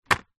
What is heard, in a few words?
office crayons pack set down on table